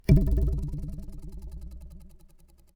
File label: Ruler